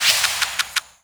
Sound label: SFX - Draw Four Opponents; uno